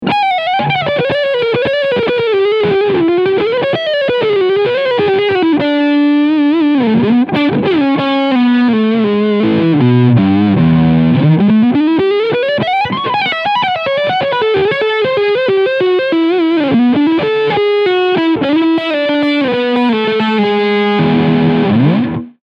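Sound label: Epiphone Prophecy LP Custom Lead Sound Neck Humbucker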